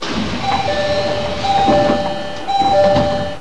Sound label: Door Open